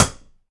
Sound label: place metal